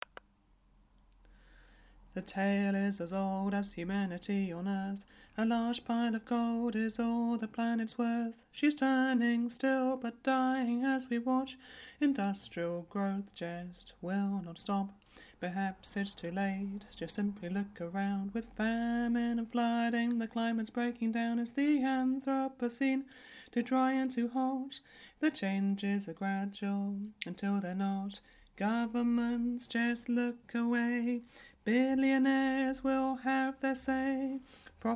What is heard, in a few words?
tenor